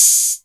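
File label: 808 Hat Open; Novation DrumstationV1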